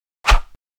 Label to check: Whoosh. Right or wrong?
Right